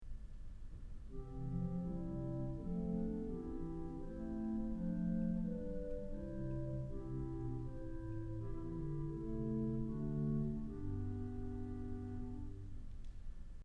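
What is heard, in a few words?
Swell Bourdon; Kellogg Auditorium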